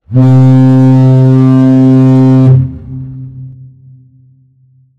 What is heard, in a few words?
Fog Horn; operation-arctic